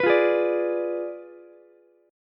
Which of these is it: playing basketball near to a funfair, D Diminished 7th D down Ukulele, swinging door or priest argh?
D Diminished 7th D down Ukulele